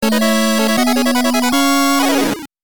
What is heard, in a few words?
8bit Victory